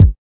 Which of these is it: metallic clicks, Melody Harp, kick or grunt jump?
kick